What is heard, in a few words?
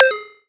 Hi Tech Drum Synth Beat